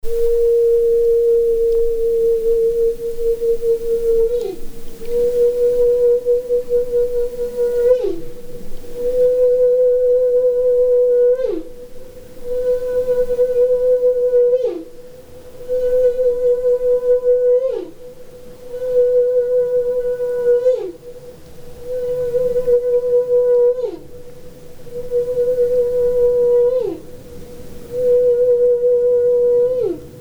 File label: feeding call loneliest whale